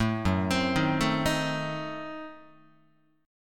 F sharp Diminished 7th, down, Guitar; arpeggiated; 0,2,3,1,1,2; 4A,4E,5A,5D,5G#,6C#

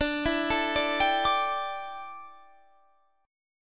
D Suspended 2nd Suspended 4th

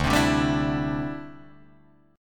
D 9th Suspended 4th D down Guitar Drop D

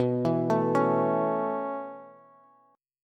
B 9th Suspended 4th B down Bass; B9sus4; arpeggiated; JGuitar

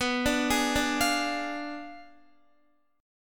B Diminished 7th B down Guitar; arpeggiated; tuning 4F,4A,5C,5G,5C,6E

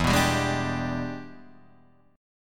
E 7th Sharp 9th Guitar Open D, chord

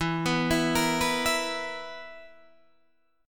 B Major 7th Suspended 4th E

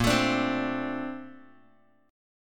A sharp Major 9th, Bass Standard; strummed

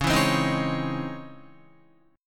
Db Minor Major 9th Db down Guitar; strummed; DbmM9; JGuitar

